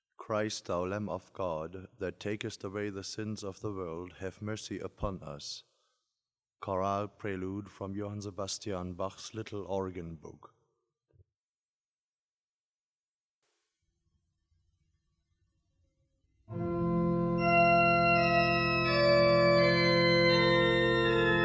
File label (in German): Orgel; Bach; Orgelbuchlein Christ Our Lamb of God BWV 619; BWV 619 Christe, du Lamm Gottes